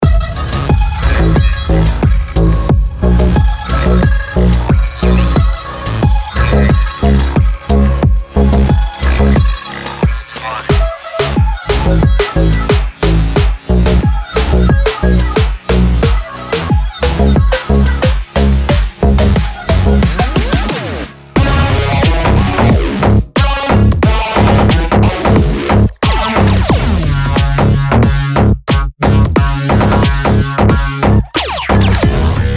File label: SLAP HOUSE PHONK; CUBASE